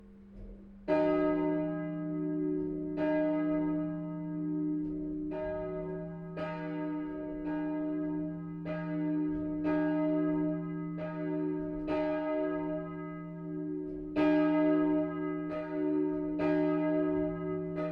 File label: bell north heiden kantnerin; bells